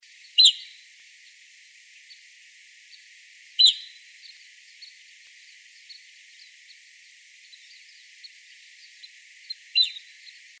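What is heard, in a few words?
Evening Grosbeak calls, Finches; EVGR; deviche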